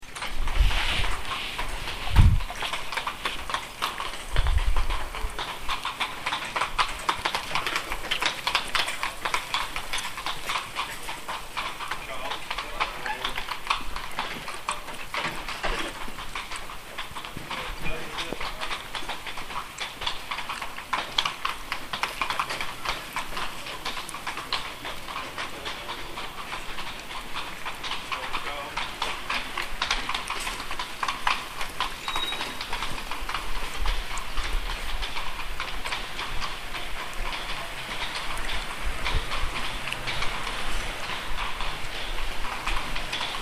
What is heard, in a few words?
horse